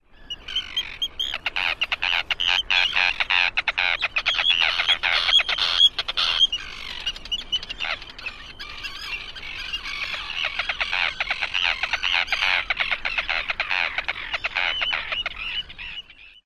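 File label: Arctic Tern calls at nest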